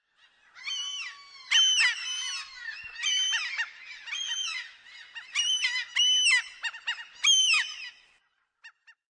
Many Seagulls Calling And Cackling, Several sea gulls cawing and calling, some close, others mo; Several sea gulls cawing and calling, some close, others more distant.; Birds